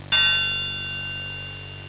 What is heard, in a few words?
triangle hit; Sound Effects for Multimedia - Volume 1